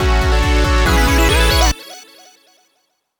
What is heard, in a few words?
dubstep club bass fill